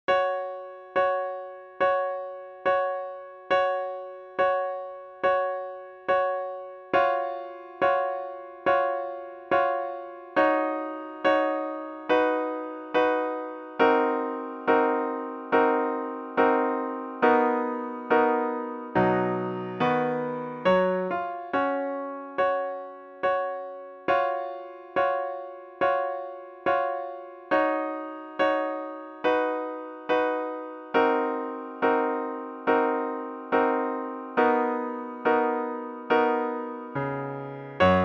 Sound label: Piano Part